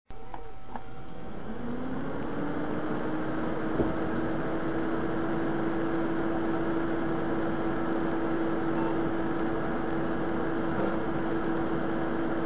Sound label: Sanyo120, ventil; ModVentil